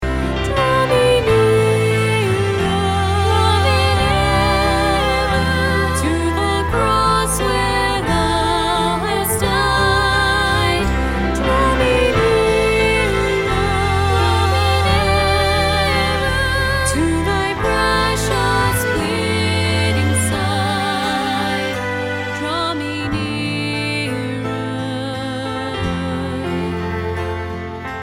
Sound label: Draw Me Nearer